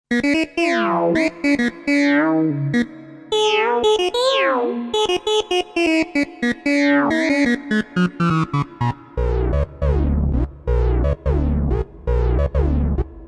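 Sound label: Funk Lead, synthesizer; Cobalt8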